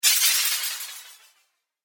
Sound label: Shatter Thin Ice Spell, magic; fiction sound effects